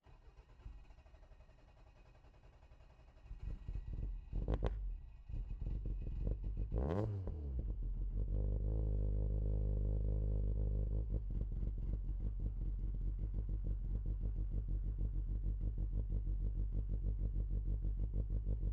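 Toyota Rally Car, Onboard, Fast, Drive, Various, Exhaust, Center; Toyota Corolla 1998 Rally car; DPA4062; Pole Position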